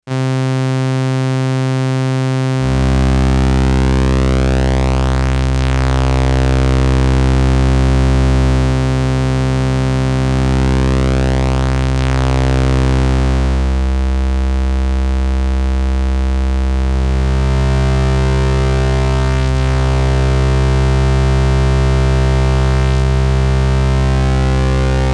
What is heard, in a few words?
Malekko Manther Sourcemixer